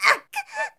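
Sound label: girl scream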